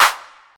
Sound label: hq verby rap hand clap sample f key, Royality free clap sound tuned to the F note